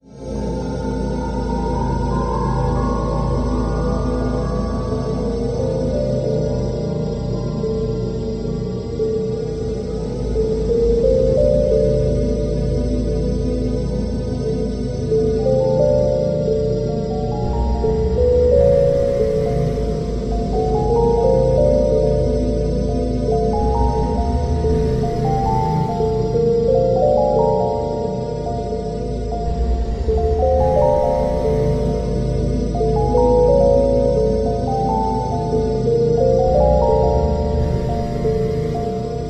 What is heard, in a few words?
Shattered Waltz; silent hill inspired music; broken notes sanatorium